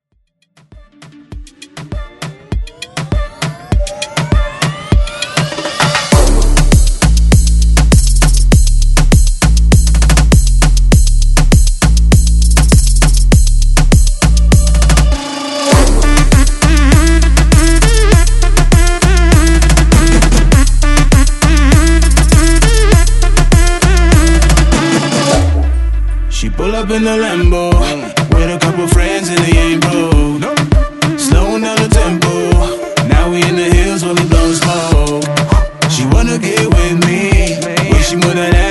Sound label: Moombah Mixshow